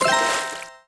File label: Splash Noise; lego my style preschool